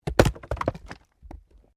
Wood Chopping Axe; Objects

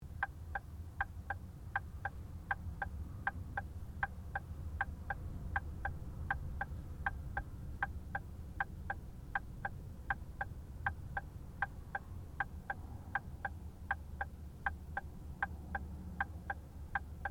Car Ford Focus Indicator Operating

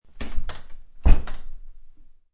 Front door is shut; Miscellaneous Doors

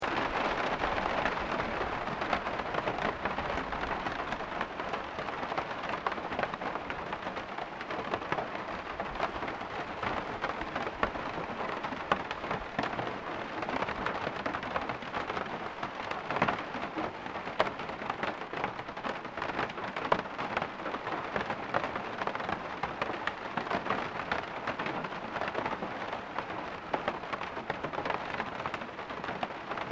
RAIN LIGHT METAL SHINGLES LOOP

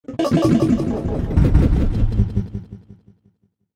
Flunky Impact Decay; Miscellaneous Impact Sound Effects

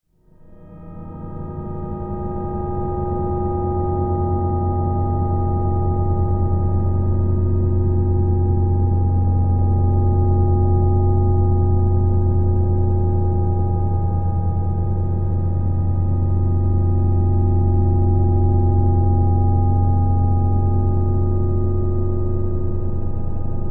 Drone Cosmic, Flutter; Production Element; Drones; Blast Wave

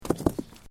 Bricks Movement Minor

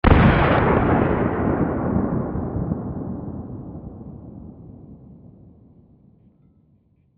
Explosion Military Blast Trail Off; Warfare; Blastwave

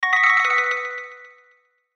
Logo Synth Bells Delay; Sonic Logo

